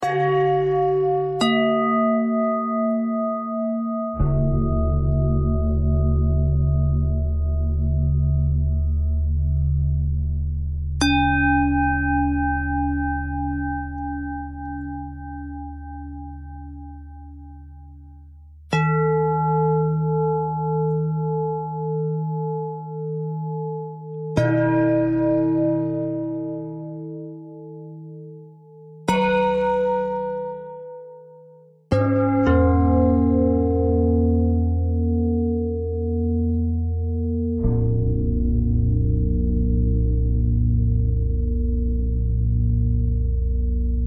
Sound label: bell bend threads